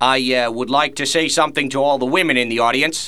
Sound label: audience